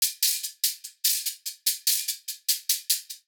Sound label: hihat loop natural 146